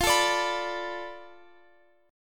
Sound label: F 6th Flat 5th F down Guitar Open F, chord; F6b5 strummed